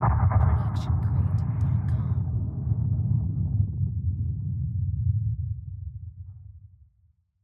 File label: fire blast